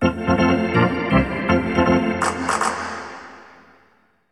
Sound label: Hockey Organ